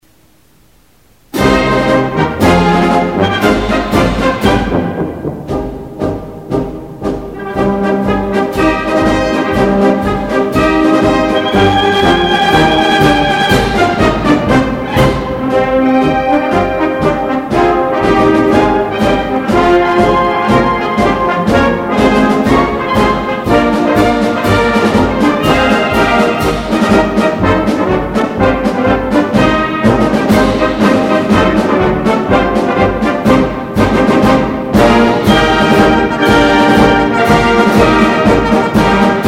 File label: Marsch Belorussian Vokzal; cccp